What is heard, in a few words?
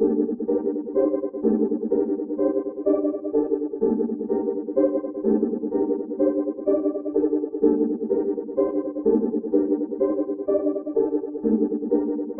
126 BPM G Min Main Chords, loop stems; Melody; Cymatics; 2022 Melody Collection Platinum Expansion; Go Faster